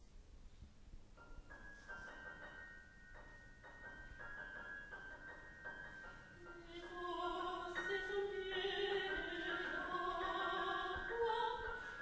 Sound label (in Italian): voce pianoforte